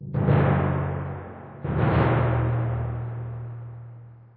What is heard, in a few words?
Creep Tymp